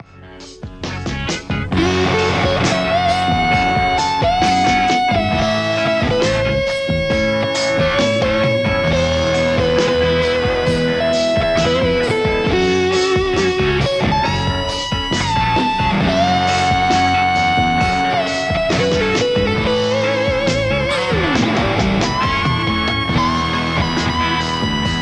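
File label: Stratocaster; amplitube3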